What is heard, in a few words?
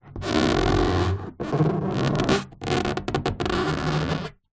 foley balloon inflated finger scrape rub squeak; zapsplat